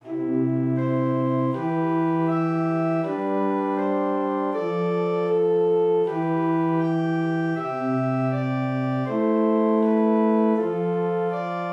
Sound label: Zwei simmiger Contrapunkt; Ronge; Prima versione dell esercizio